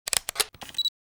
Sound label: camera shutter modern